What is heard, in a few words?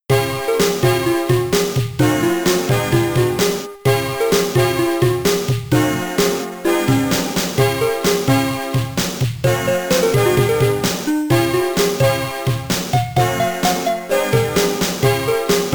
London Bridge is Falling Down, Macintosh II; lemmings